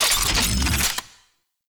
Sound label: Reload, Archwing Primary; Warframe; Larkspur Prime